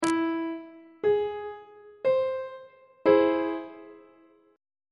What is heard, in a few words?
f flat augmented chord on treble clef